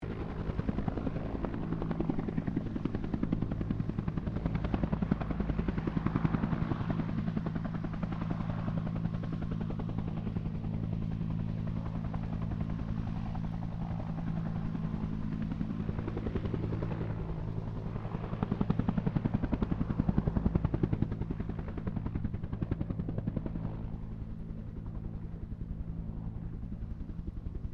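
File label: helicopter Bell UH1 Iroquois idle distant, stereo; RAN UH-1 Iroguios; Helicopters (Sound Effects:Vehicles)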